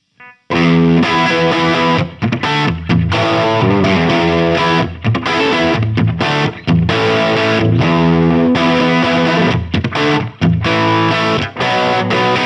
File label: Sound City Master One Hundred Humbucker Normal